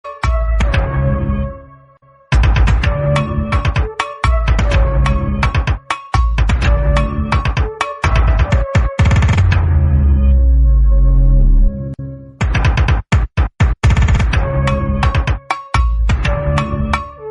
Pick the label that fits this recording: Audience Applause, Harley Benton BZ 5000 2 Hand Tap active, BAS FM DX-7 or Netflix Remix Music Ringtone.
Netflix Remix Music Ringtone